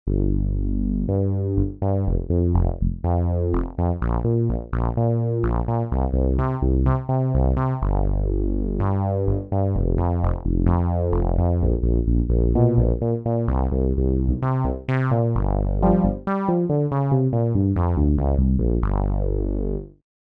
Rubber Bs, Roland JV-880 Factory Patches

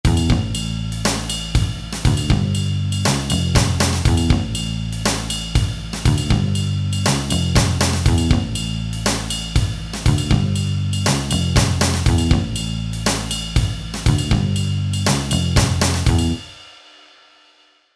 Hard Rock

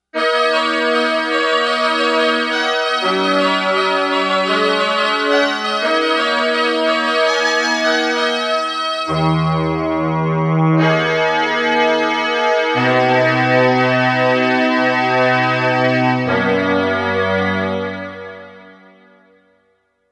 PPG PAD

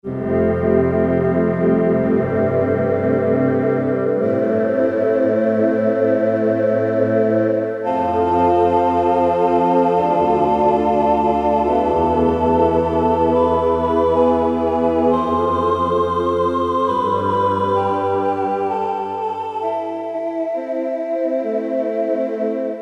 Voices, Roland D-50; Factory preset demos

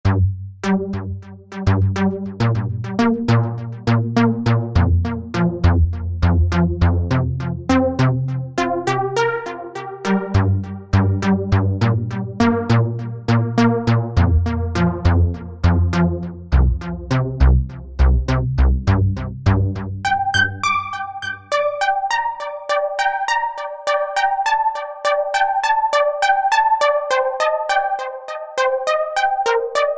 SYNTH TEXTURES - Delay; Temae Delay; Apple GarageBand 2

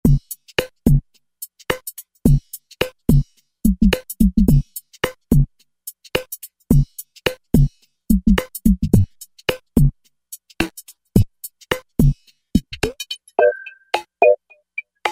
Tronic KIT, Roland V-Synth

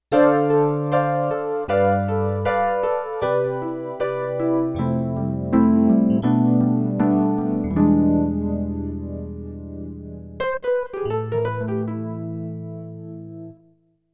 FM Rhodes, Roland VC-1

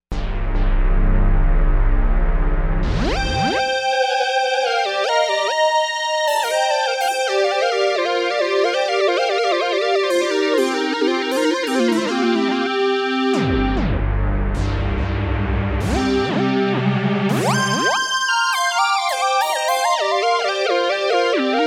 Vintage Lead

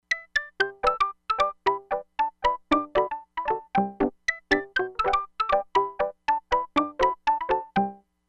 KNOCK ON WOOD

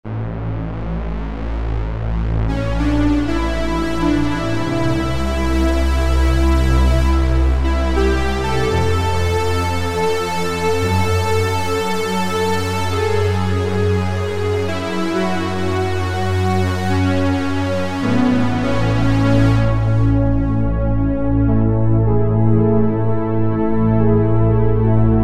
Strings; Cheetah MS6

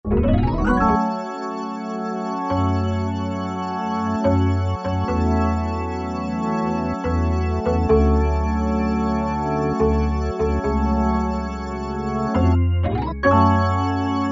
Expr Organ, Generalmusic S2R Performance

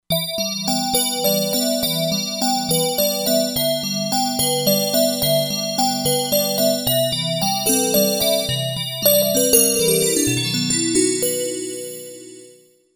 Tine Bell

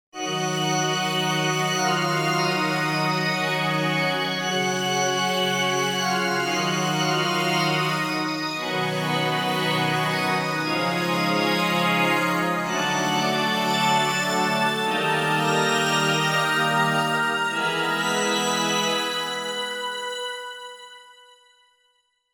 Digi Choir,Roland SRX-07 Ultimate Keys

